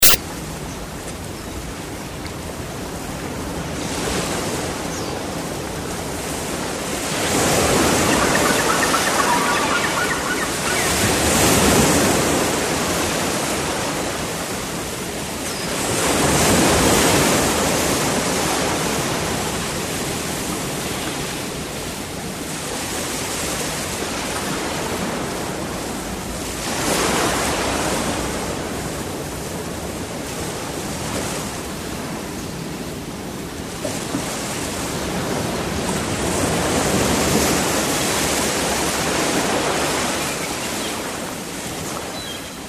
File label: ocean